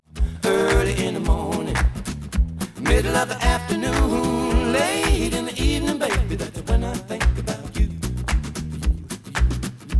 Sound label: Stereo output noised; 3bit